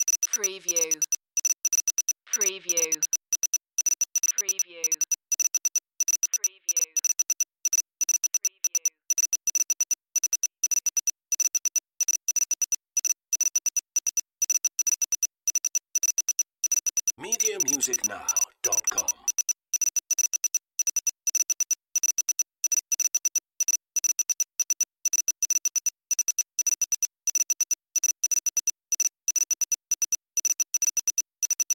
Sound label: Computer Process Beep